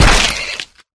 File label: pop; flood